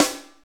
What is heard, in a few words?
DANCE SET, DRUMS